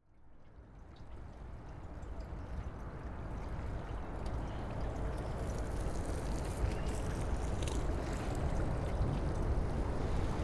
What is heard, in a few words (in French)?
dépôt tramway; les boucles de l'isère; voix d eau